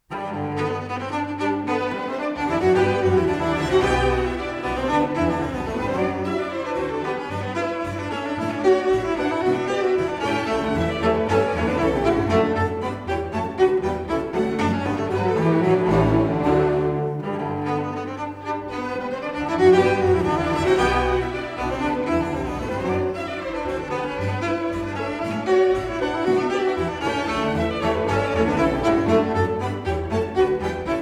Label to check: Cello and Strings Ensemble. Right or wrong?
Right